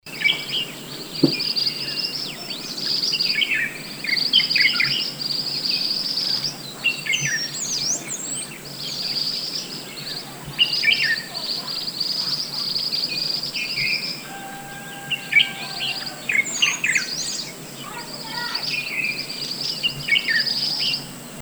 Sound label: Ashy Minivet Group Call; minivet cendre pericrocotus divaritacus; Guangxi Shanli